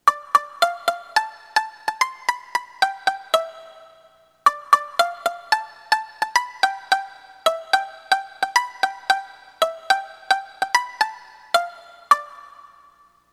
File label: Korg EK 50 L Tropical Pluck